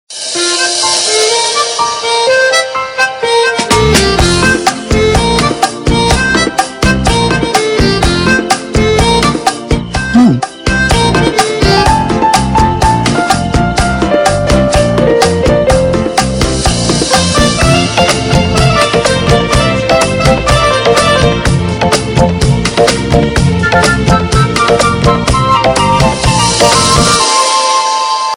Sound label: Funny BG Tune; Virat Manvy